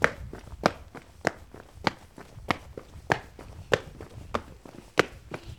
Running On Pavement Loop

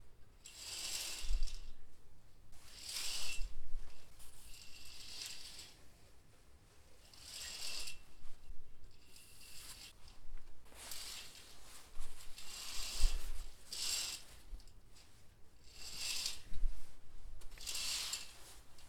Curtains

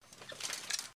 Plate Armor Rustle

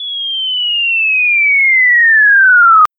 Cartoon Fall

